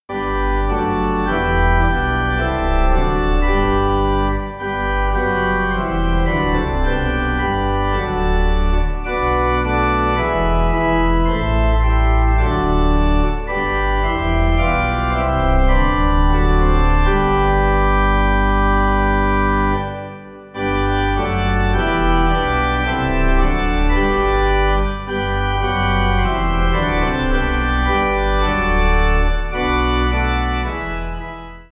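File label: Pipe, Pipe Organ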